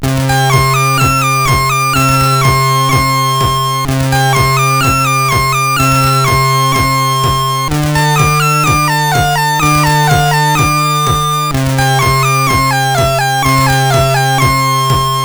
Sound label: sid CSG8580R5; Fiendish Freddys Big Top O Fun